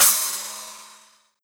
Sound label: splash, virtual drum sounds